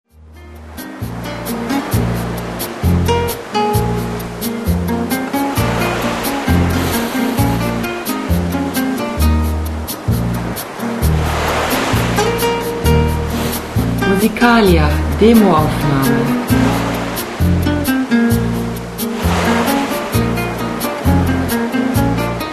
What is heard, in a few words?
tropical waters